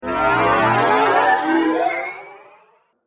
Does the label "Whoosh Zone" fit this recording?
Yes